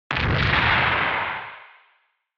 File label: Retro Anime Robot High Short